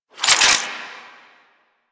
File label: Spear Gun Ready, Weapons; Warframe; Corpus